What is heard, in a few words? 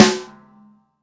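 snare, GM drum set; AKAI S-Series CD-ROM Sound Library VOL-8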